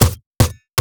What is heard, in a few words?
bear basicattack; LoL; annie base